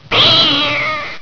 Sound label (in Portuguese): GATOS